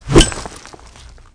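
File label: grenade pin pull; weapons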